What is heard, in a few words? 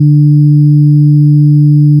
additive cello single; FFTimbre